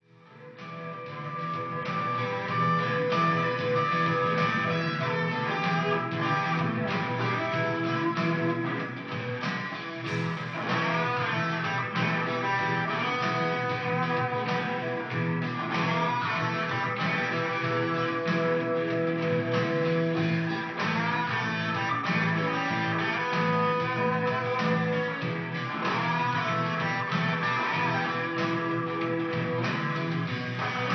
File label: Rock; Usen; Fist of the North Star Lost Paradise; Hokuto ga Gotoku; PlayStation 4 gamerip